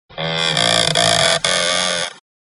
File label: creaking